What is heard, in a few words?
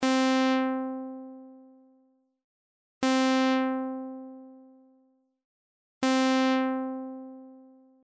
ADSR Filter Env; synthese sonore les enveloppes